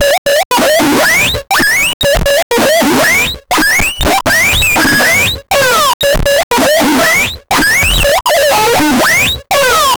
a clarinet squeaking; woodwind

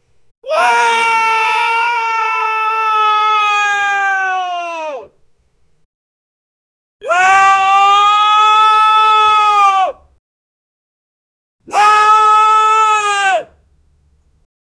mild fear scream

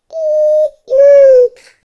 short joyful baby boy sho; humans

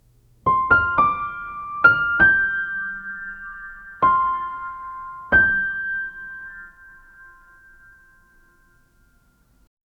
piano xylophone playful

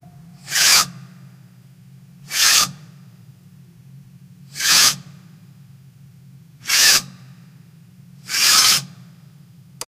straining push sound; effort

